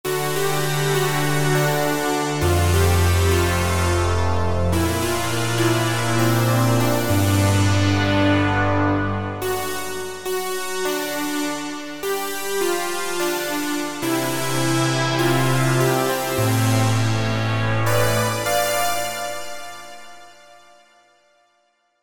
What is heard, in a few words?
SYNTH TEXTURES - Melodies; Molokai Melodies; Apple GarageBand 2